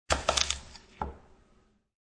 A single quick chop of fresh, crisp, produce with sharp knife; A single quick chop of fresh, crisp, produce with sharp knife on wooden cutting board; Chopping Crisp Produce Quickly